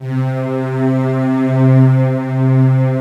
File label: ANLG STR, ANALOG STR